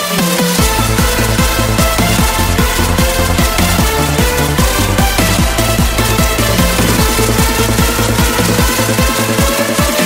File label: hardstyle